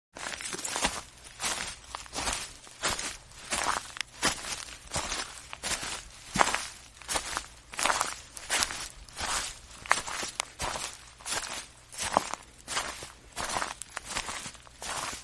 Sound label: grass walking